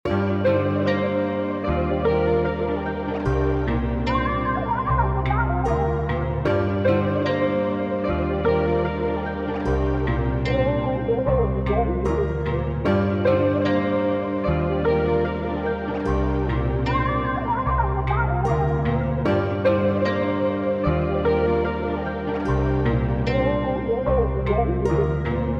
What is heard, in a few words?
150 BPM G Min, Guitar Melody Loops; Cymatics; Ascension Guitar Melody Loops; Trap&Lofi; 吉他; Blossom